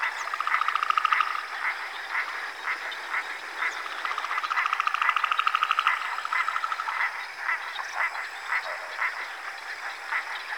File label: Frogs Loop; Ambience; Props; Warframe; Eidolon Plains